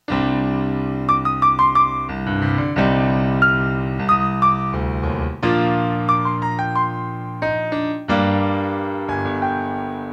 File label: Semi-Tone Slide